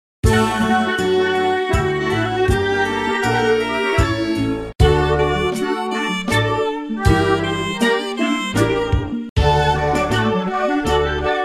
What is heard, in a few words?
Waltz